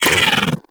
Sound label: Bug Vocal Pain; Characters; Warframe; Hunting Critters; InfKDriveBug